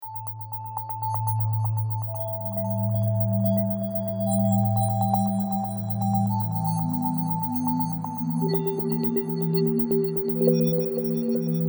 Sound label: Ambience Act II